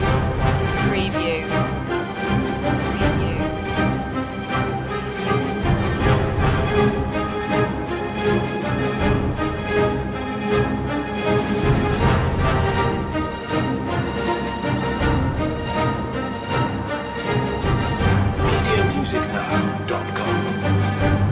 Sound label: battle march loop